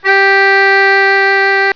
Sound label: overtone